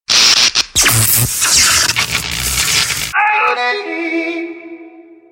Electric Yoddle

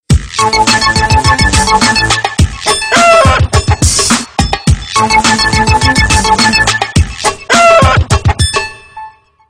Chicken Beat

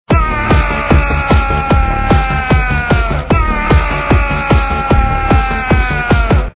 Arrgh

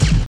Counter Strike USP

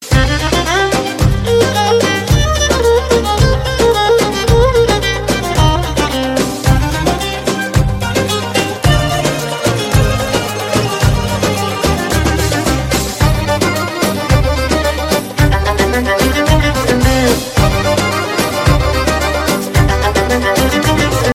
Arabia

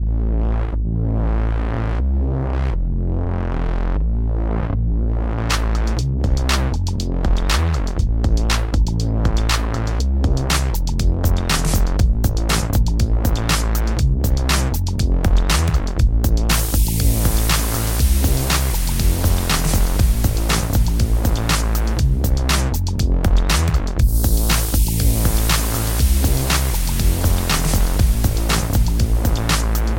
doeng-doeng

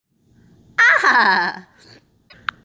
Dolphin Laugh

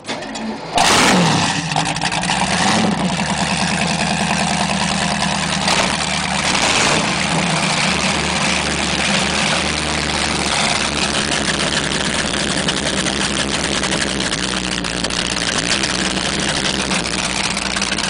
Boat engine

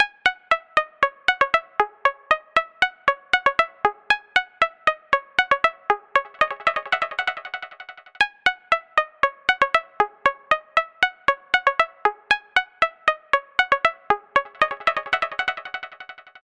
Melody Delayed

